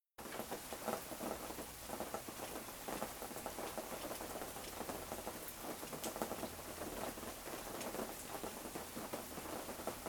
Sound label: Rain Chimes Fire